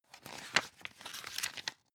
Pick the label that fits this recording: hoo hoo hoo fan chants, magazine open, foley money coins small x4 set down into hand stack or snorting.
magazine open